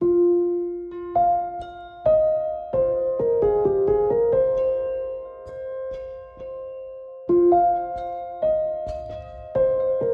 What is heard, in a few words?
LO-FI PIANO Melody Fm 66bpm; LO-FI PIANO VOL.1